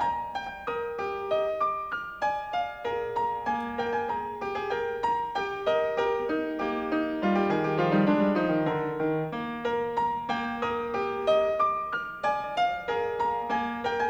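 15 Variations with Fugue in E-Flat Major, Op. 35 Eroica Var. VII. Canone all'ottava, Piano; Beethoven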